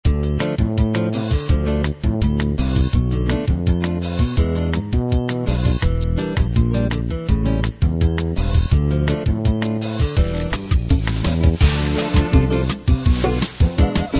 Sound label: bossa nova